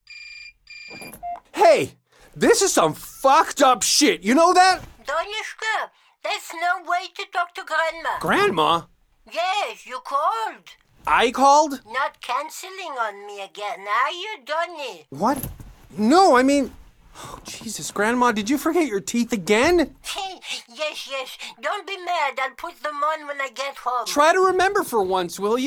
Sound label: tutorial music; Blind Drive